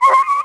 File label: wolf hit; DAoC